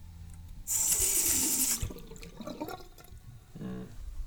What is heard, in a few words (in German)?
Waschbecken